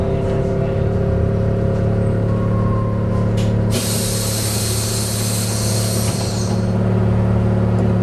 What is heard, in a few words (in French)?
portes